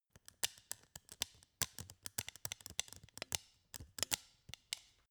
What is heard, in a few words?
scissors handle